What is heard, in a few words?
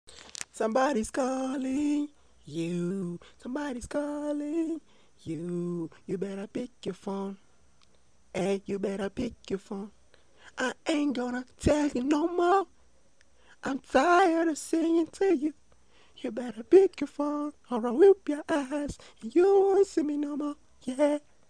ringtone